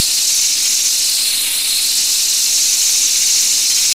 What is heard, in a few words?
Turbo Screamer; crashmetal cyberpunk